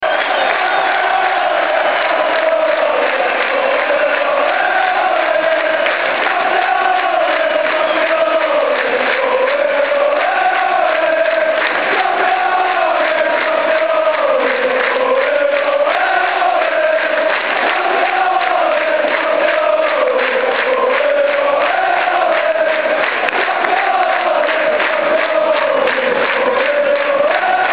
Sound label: Campeon